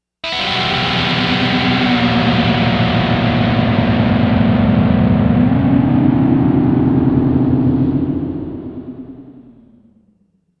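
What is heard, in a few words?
dirty guitar e1005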